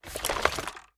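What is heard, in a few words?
sniper drop, reloads; gmod; iw8; xmike109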